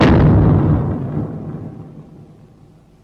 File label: Footstep; Shin Godzilla